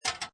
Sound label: Pinball Sound Metal Plates Click; Video Games